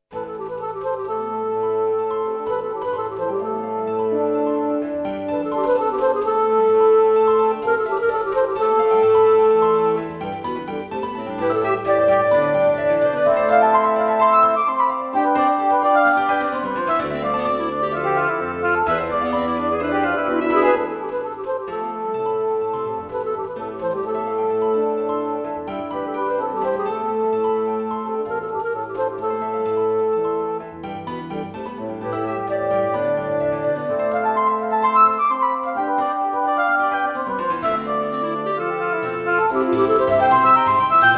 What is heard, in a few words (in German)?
Klaviersextett Anfang